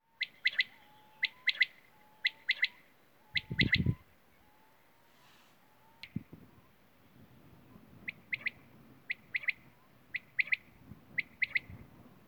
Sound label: huit huit huit